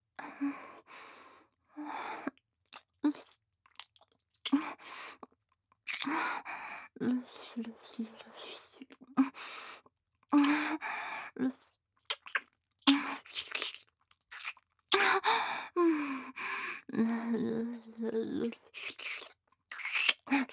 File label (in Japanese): 喘ぎながらの乳首舐め